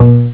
prellwand; ballistix; Amiga Soundtracker Sample Packs

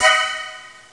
violine; ST-XX Amiga Soundtracker Sample Packs

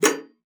Ventil; Xsample VOL-3; STACC+FX

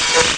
rauschen; Amiga Soundtracker Sample Packs

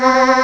chor; Amiga Soundtracker Sample Packs

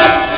kuckuck; ST-XX Amiga Soundtracker Sample Packs

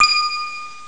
glocks dur; Amiga Soundtracker Sample Packs

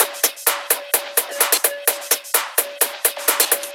Drum Loop 128BPM FULL ohne BD; Vengeance - Essential Clubsounds Vol.5; VEC5 Drumloops; VEC5 Multi Loops 128BPM

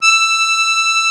AKK VIOLI, akkordeon; Best Service; Alpine Volksmusik

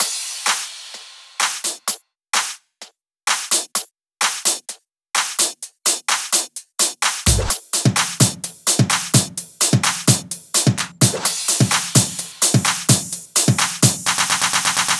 Drum Loop 128BPM FULL ohne BD

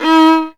GEIGE; Best Service; Alpine Volksmusik

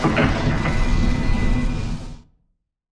LADERAMP; Best Service; Advanced Mega Effects

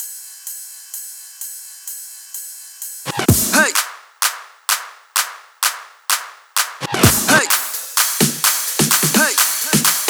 Drum Loop 128BPM Full ohne BD FX

